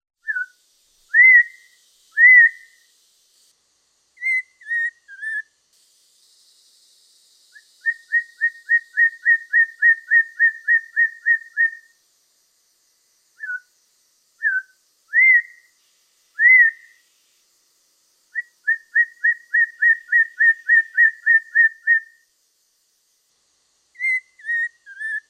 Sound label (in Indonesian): suara poksay mandarin variasi